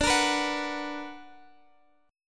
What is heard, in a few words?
B Minor Major 7th